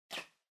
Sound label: Packaging Air Cushion Far Pop; 梱包用エアークッション; 遠い 弾ける; 梱包材